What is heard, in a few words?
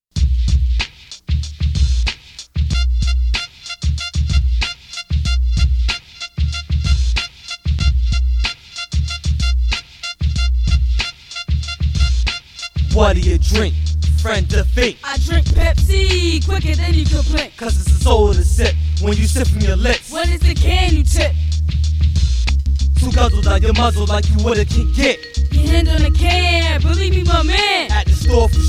Jingle